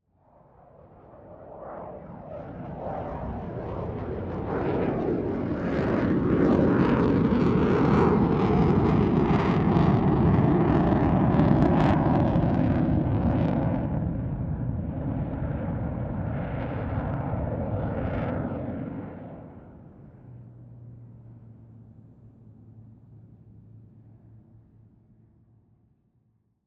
Jet, Fighter, FA-18C, Hornet, Medium Distant, Climb, Right to High, Crest and Hold, Kill Engine; Airborne Sound; Attack Aircraft Maneuvers